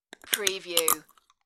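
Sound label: Beating Nut Shell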